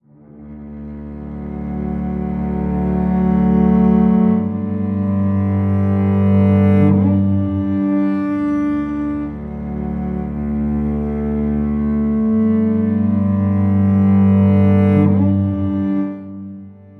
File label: Cellos